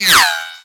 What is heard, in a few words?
Bullet Whizzby; Warframe; Bullet Impacts; Whizzbys